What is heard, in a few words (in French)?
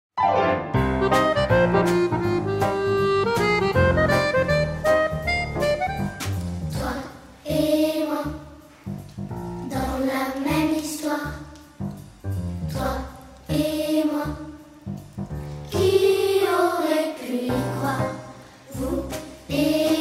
version chant